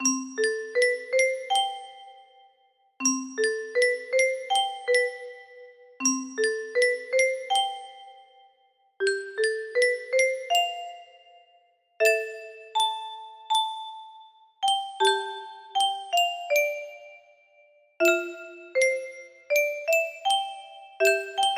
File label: lullaby, music box; genshin impact; columbina